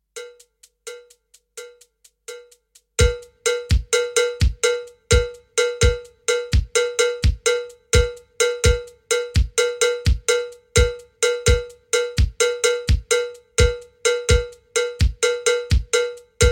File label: standard 12 bell fast